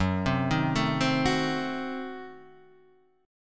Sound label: F sharp Diminished 7th F sharp Guitar Standard; arpeggiated; JGuitar